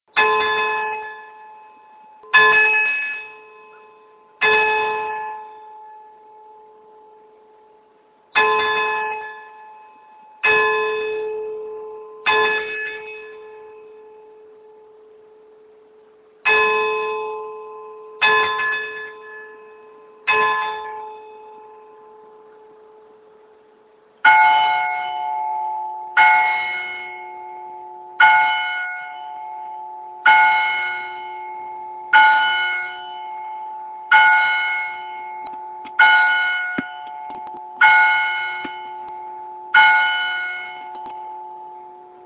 belltower bells

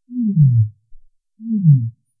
sei whale double

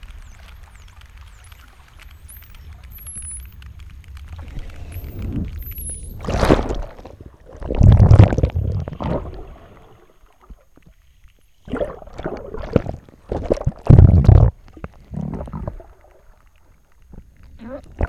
surface whale

